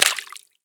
pistol drop puddle; STALKER; IWP

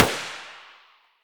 mdr muzzle mono far, weapons

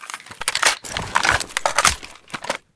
ak74u reload; STALKER; IWP

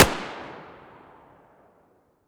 muzzle mp9 mono distant, weapons

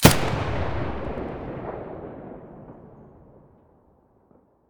muzzle sa80 mono

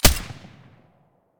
mdr silencer stereo, weapons

